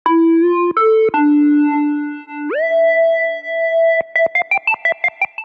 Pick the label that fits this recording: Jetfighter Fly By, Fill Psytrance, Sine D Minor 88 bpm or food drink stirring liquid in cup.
Sine D Minor 88 bpm